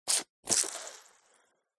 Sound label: human hands wet squeeze together